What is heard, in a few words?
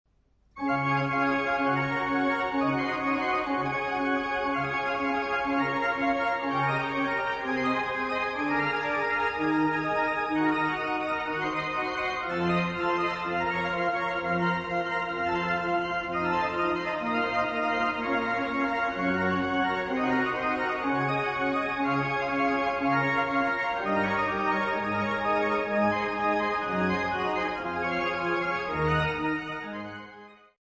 arpeggio